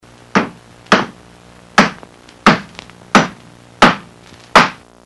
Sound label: hammerin; machine